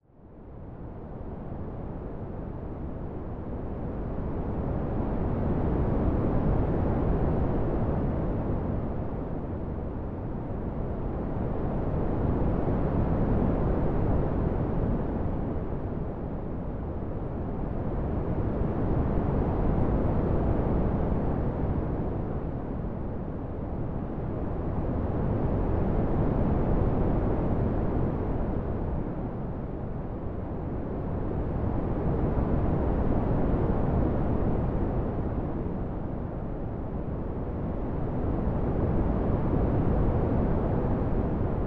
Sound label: ocean waves; the sleepy owl soundscape pack